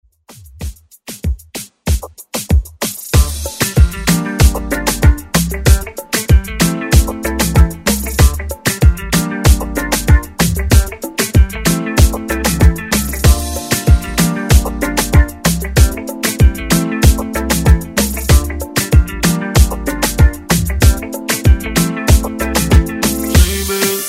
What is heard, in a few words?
Moombah Afro Redrum